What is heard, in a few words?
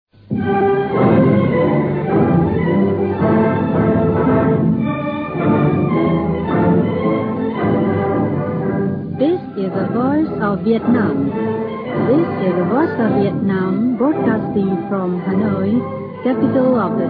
interval signal; voice of vietnam